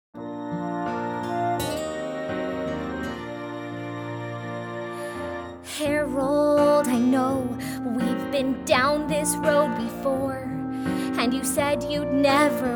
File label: vocal